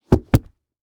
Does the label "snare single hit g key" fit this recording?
No